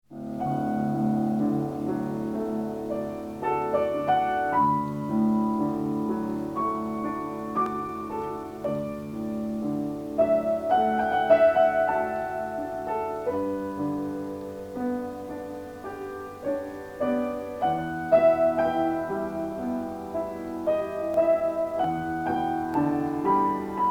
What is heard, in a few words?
Meditation From Thais